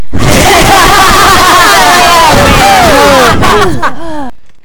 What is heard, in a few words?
Laughing